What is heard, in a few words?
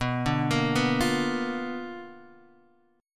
B Minor Major 7th Flat 5th Guitar Half Step Down